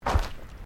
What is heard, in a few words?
Footsteps Boots Walking Track Sandy Step; Footstep Foley; Human